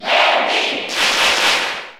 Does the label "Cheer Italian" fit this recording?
Yes